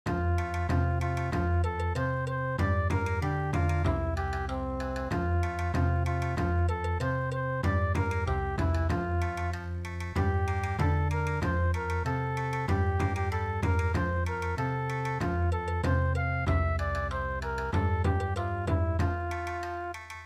Bon Odori, traditional; midi

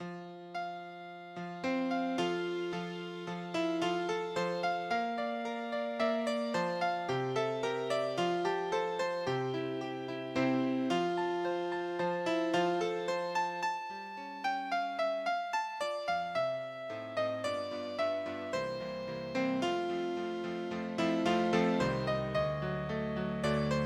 Healing Piano

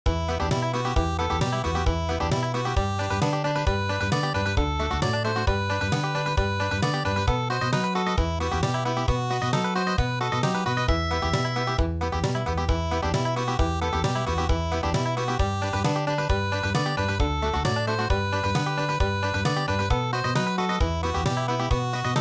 Country; VOLGA River

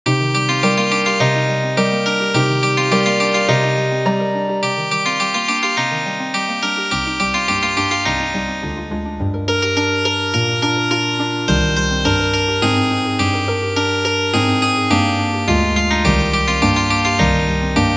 Harp

